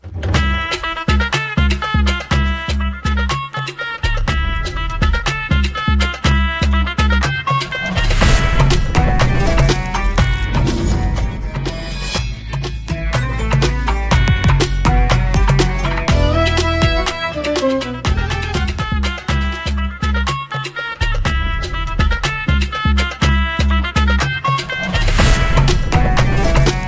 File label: BGM